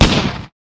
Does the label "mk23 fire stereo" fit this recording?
Yes